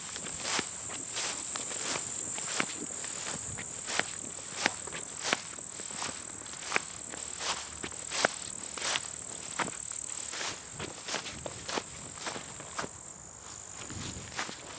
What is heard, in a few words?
Meadow Walk